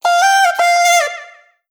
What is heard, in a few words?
phrase F, Vocal; Slate Digital Omnivox Vocal Sample Pack